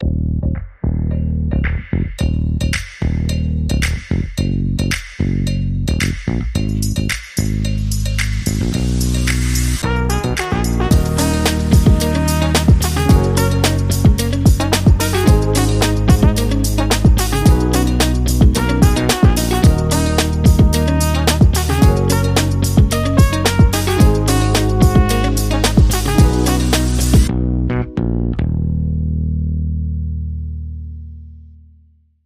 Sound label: Funky